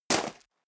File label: step leather shoes gravel medium single right; schritte